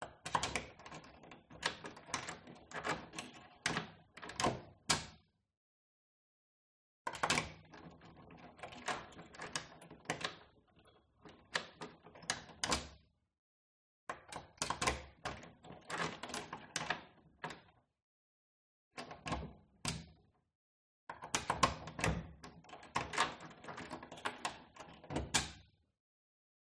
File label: LOCK Front Door Various Patterns Close Perspective